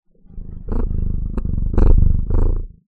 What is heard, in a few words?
Cat purr